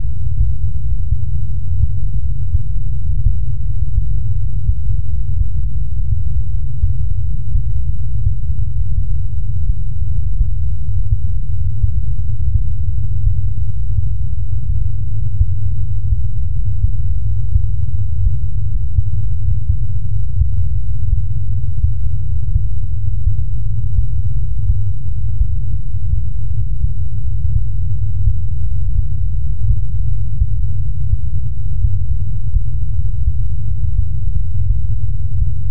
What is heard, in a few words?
pulsar sound